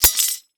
Weapon Sword Drop On Concrete Quick; AdobeCC

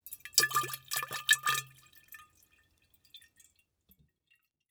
Liquid Water Wine Glass Pour Liquid In

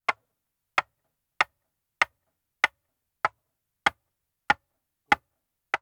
Industry Tool Rock Hammer

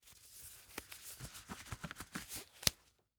Emergency Gloves Latex Gloves Taking Off; emergency effects; AdobeCC

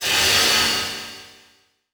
Transition Metallic Distortion; Production Element

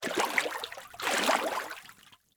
Liquid Water Water Wave Splash Two Splashes

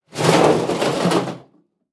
Impact Garbage Can Drag Over Dirt And Rock Short; Impacts

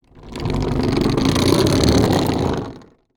Household Chair Roll Across Hollow Wood Floor

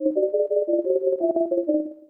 Science Fiction Sci-Fi Electronic Musical Tone

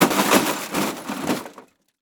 Crash DVD Player Tumbling On Pavement; Crashes

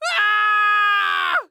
Horror Scary Human Male Scream

